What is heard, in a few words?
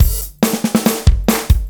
Fill 140 BPM; Loops; vengeance essential club sounds-3; VEC3 Fills